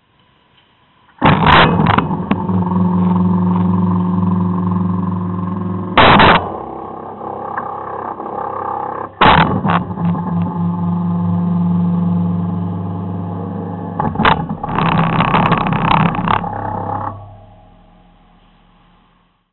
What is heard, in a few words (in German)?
schranke sound